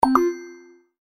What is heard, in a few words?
Positive Pop Pick Up